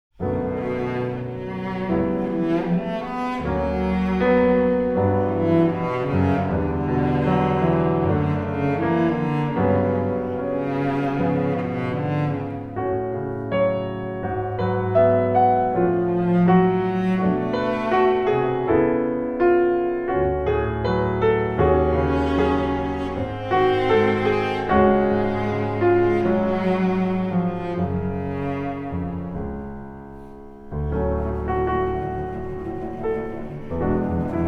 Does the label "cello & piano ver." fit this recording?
Yes